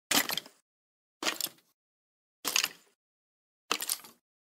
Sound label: step